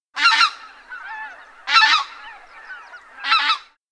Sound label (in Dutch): vogel